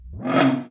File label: Misc Perc; musicradar; cartoon caper